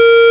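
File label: beep sounds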